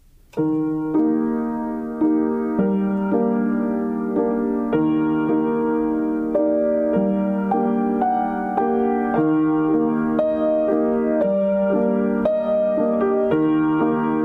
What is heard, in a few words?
inserts of piano